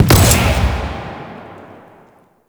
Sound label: Missile Barrage Fire B; Enemies; Weapons; Warframe; Arachnoid Camper